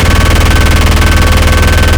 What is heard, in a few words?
shoot fast; weapons; tf2classic